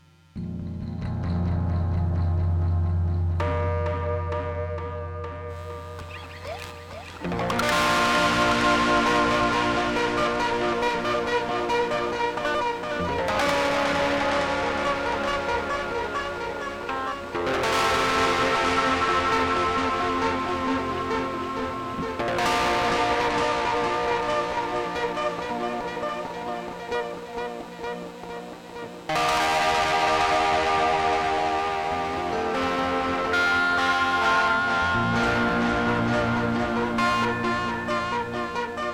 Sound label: electric